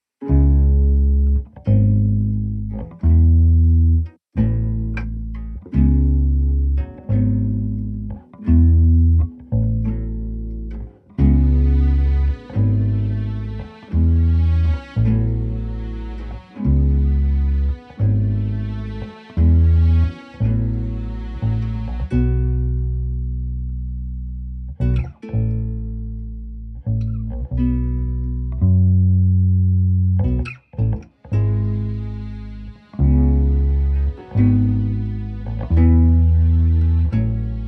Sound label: Piano Underscore; Anger Music Library